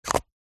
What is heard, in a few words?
household insect repellent roll on plastic lid place on; zapsplat